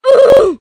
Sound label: multi oof; freak fortress 2; roblox; noob